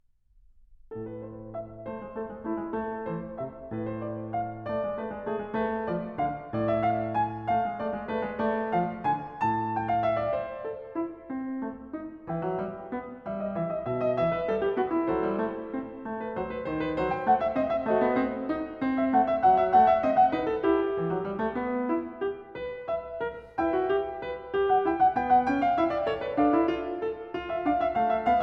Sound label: Prelude No. 20 in A minor; Well-Tempered Clavier; BWV 865; Bach; Book 1